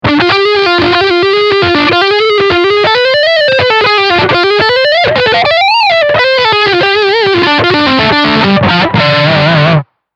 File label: Lead Line Front Humbucker